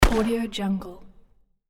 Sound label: gun blast single outdoor silencer